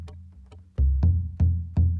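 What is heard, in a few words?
Calm Far, music; from dust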